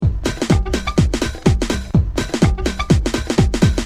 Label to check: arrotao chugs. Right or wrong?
Wrong